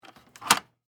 Door Unlock Bolt, Foley, Door, Unlock, Dead, Bolt, Hotel, Door; Miscellaneous Door